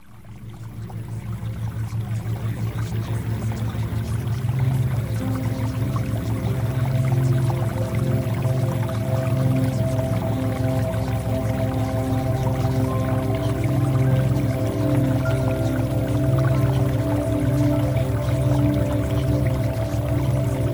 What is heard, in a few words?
Music Theta Waves; Premium Subliminals